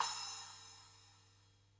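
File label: cymbal hi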